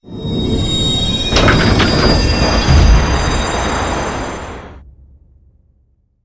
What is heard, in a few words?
grav; Cosmic Rage; ships misc